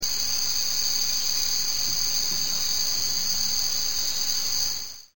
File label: cicades